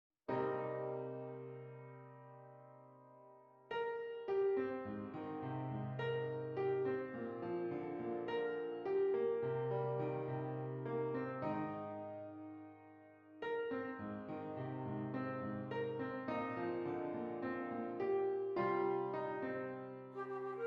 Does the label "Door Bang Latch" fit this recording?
No